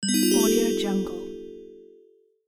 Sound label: magic surprise